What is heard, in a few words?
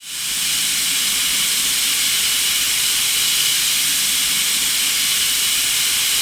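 Aircrafts MiG-29 Canopy Canopy Cockpit; DCS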